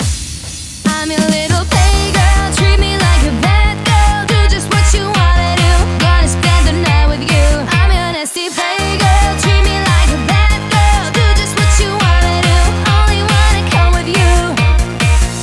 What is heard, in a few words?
Female Mix, 140 BPM; Vengeance Samples; Vocal-Hook Kits; Song Kit; Play Girl